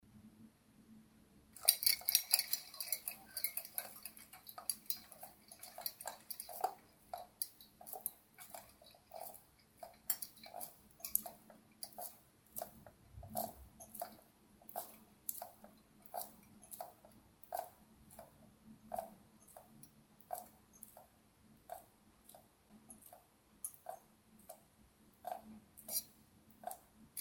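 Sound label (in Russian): толчок люстра с крупными кристаллами задевает плафон о потолок; Звук раскачивающейся люстры; для создания атмосферы ужаса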